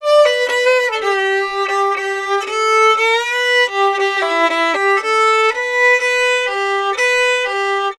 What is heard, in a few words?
Fiddle, Appalachian